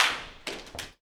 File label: Flamenco, Tapping, Zapateado Solo; ProSamples vol.46 - Flamenco